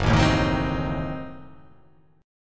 F sharp Diminished 7th F sharp

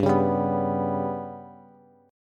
G Major Flat 5th Bass, chord; GMb5 strummed; JGuitar

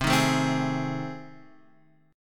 A Minor Major 7th Flat 5th

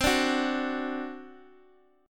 C Suspended 2nd Flat 5th C Guitar Half Step Down

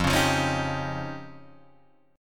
F 7th Suspended 2nd Sharp 5th, Guitar, Drop D

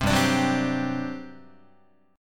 G Major 7th Suspended 4th, Guitar; GM7sus4; strummed; 3,3,5,4,4,6

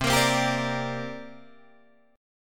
C 7th Suspended 2nd C down Guitar Drop D

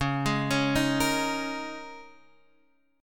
A sharp Minor 11th C sharp, Guitar chord

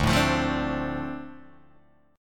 D-Minor 7th D Guitar Open G, chord

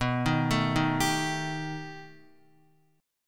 B Augmented 7th B down Guitar; arpeggiated; JGuitar

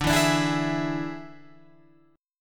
D Suspended 2nd Flat 5th D, Guitar, Open F; strummed

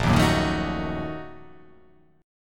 A sharp Major 7th Suspended 4th Sharp 5th

